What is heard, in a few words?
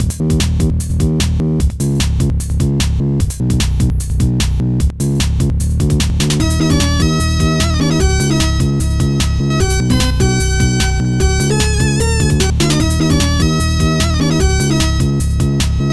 Echo Dub; Minskies Furballs; Amiga